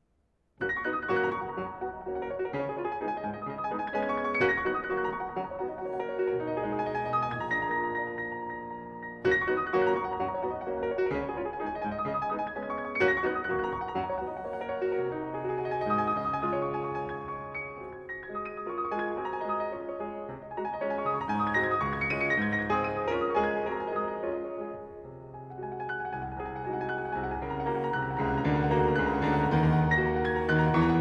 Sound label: piano; F.Chopin; Etude Op.10 No.5 in G flat Major